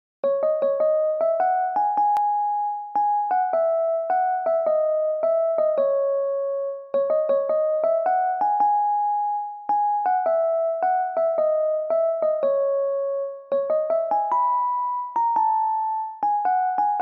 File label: theme music bgm piano notes; punnagai mannan